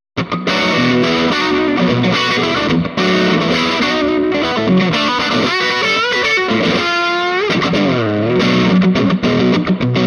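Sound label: Tele Grind, Swart Super Space Tone 30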